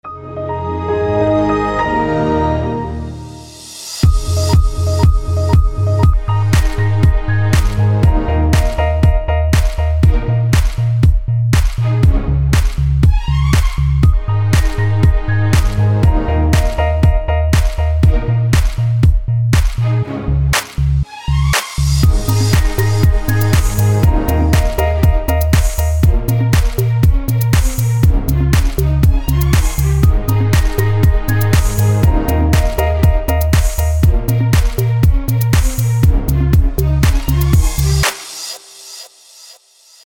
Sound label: Windows Privetstvie